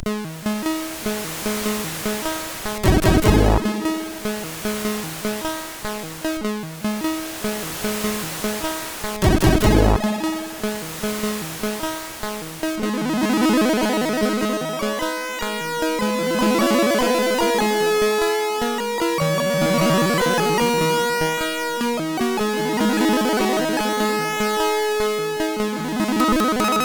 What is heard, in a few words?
Neo Enka CSG8580R5